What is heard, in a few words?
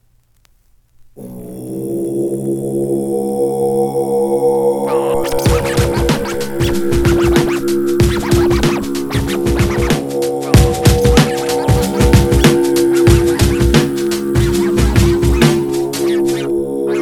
Tibet Beat